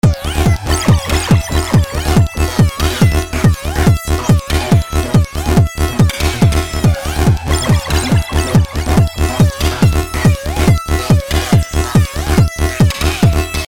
techno

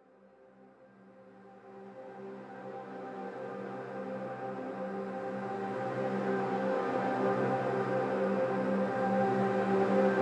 glass core dronar metal and gl

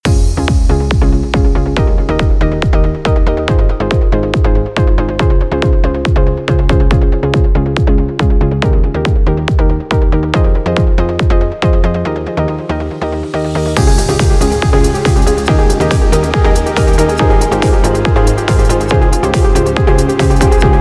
a sweet trance

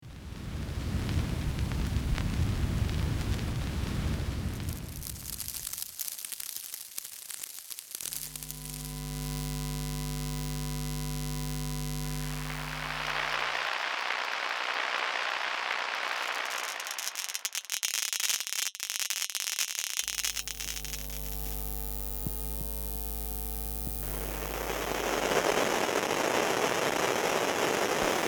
noise

